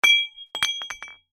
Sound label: Impact Glass Bottle, Drop, No, Break; Smashes; Objects; BlastWave